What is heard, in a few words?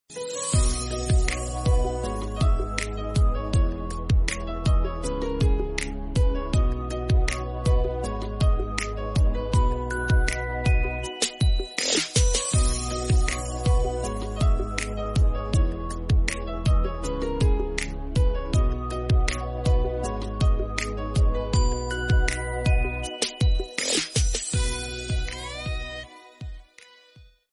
instrumental ringtone; kabir singh